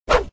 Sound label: Swing; mega boulder rampage gamerip